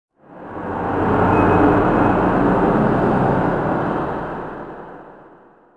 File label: city, zones; Zoom3City